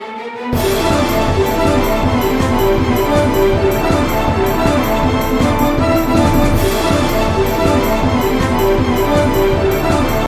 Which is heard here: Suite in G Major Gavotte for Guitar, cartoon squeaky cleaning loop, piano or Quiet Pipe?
piano